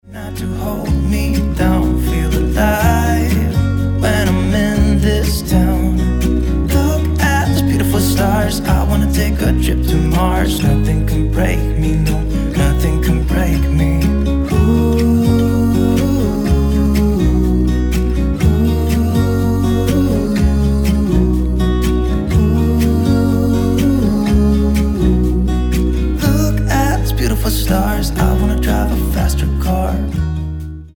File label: Acoustic Version